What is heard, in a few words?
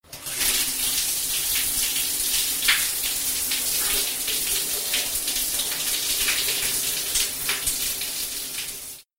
shower